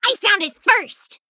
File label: Female Gnome Attack